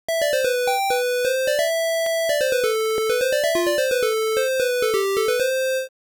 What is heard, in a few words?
Night Time; Guardian of Silverkeep